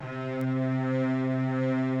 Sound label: Instrument